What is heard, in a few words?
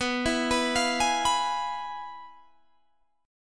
F Minor Major 7th Flat 5th, B